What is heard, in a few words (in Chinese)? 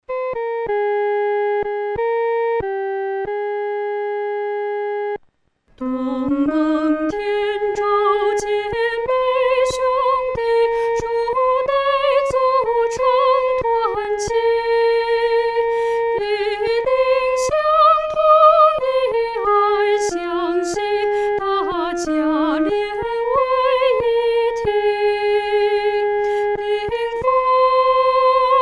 独唱 第一声